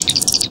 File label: comm badge off; startrek